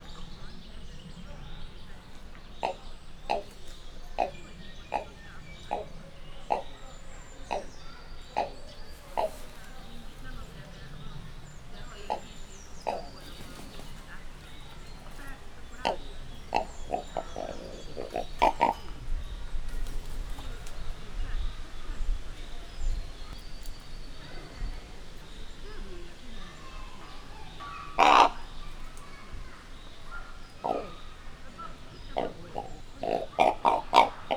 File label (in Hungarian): bemelegítés, holló; miskolc zoo